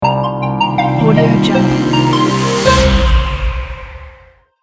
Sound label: the logo